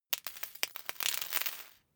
paperclip drop floor few

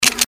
short industrial event

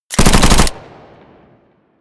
smg uzi 45 modern burst

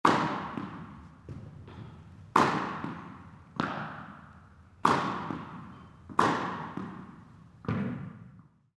indoor tennis serve

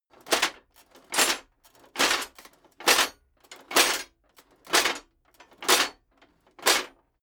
metal plate armor movement sequence

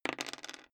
dice roll table soft

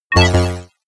sci-fi notification negative

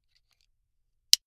buckle plastic insert close soft

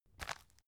tissue package pick up

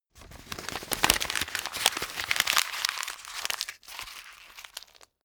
sheet crumple

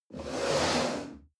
chair wood slide on floor hard

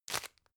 tissue grab

